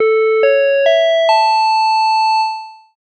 お知らせ・始まり; 戏剧音效

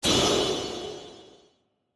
トランジション シャキン

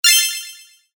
アイテム入手 レトロ; モノラル

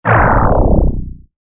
ワープアウト

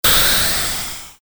ミサイル発射 モノラル

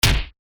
インパクト ピシ; 場面切り替えや心理描写; 演出効果音

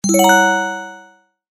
不気味・不安な音 ベル

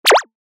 ピョン コミカル

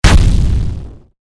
爆発 小; モノラル; 武器効果音

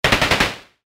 アサルトライフル 5発; 武器効果音

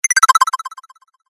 キャンセル 高音 レトロ

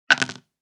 サイコロ二個を振る

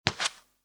スリッパ 一歩; 足音の効果音

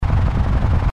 戦車が走る音 ループ; 武器効果音

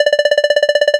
会話; nes retro talk sound effects

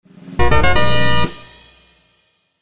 ファンファーレ; 動畫和制作視頻的音效

